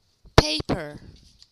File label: paper